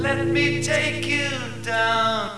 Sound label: man pain